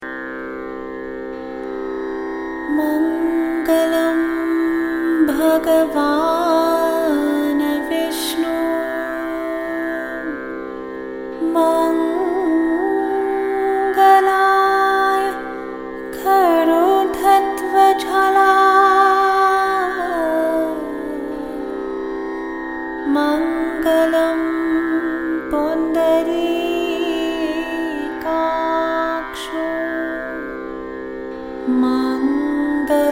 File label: Raga; Agnya Chakra; Meditation Music